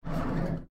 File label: Drawer Open Sound